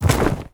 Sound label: pennant pickup, inventory; ui